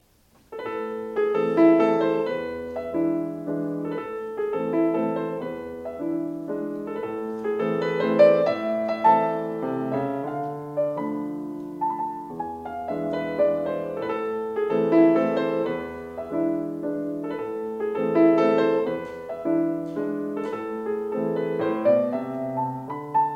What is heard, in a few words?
Mazurka